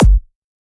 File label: Dr Kick